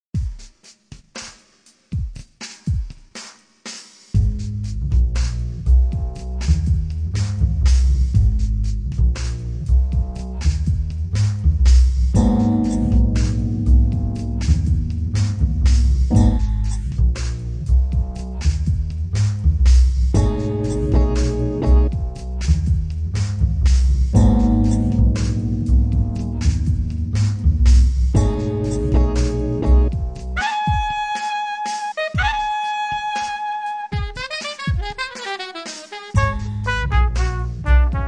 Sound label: jazz